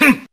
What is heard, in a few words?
"hmm"; Voice; ooh ahh; human